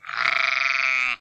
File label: sheep